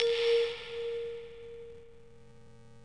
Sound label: brick; stardunk ios gamerip